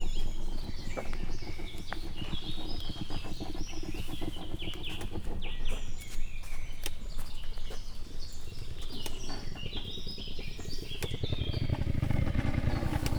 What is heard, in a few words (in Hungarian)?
le vizelt farkával a hátát csapkodó hím, kétpúpú teve; miskolc zoo